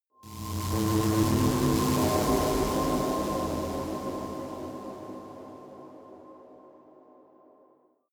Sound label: space unknown alienation call; energie